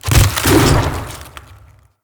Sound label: Behind Wall Attack Swipe; Bromius